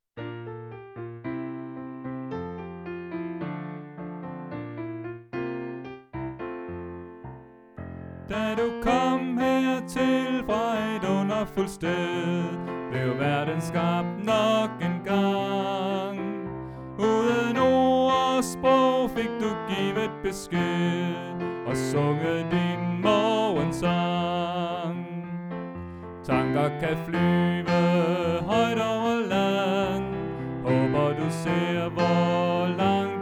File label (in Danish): Bas; oevefiler